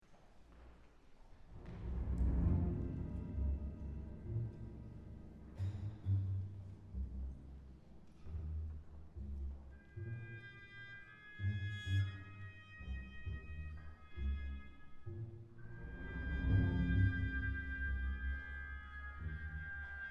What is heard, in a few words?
sirens for orchestra